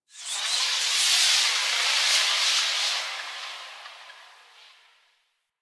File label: Void Storm Wind Gust; Warframe